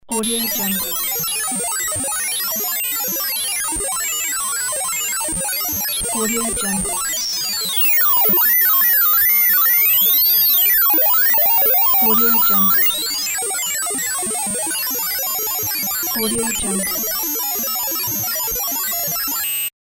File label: cyber hitech sound